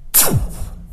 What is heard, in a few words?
laser; ss13